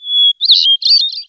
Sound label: swamp bird, canals